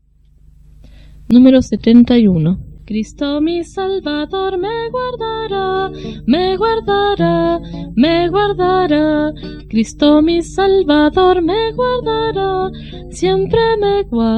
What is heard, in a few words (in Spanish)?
Coro, himnario niños